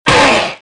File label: velociraptor - Hurt; RadwinIsland